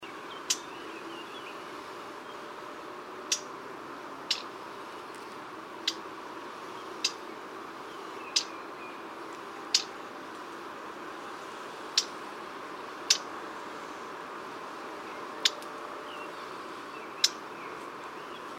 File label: Acrdum mono